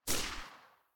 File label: silencer p99 mono very far, weapons